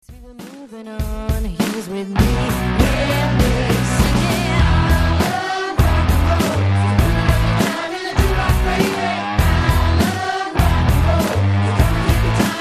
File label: Bassline